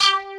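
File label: CUTTER X BASS; Pro-Rec - Synthsonic; TRINITY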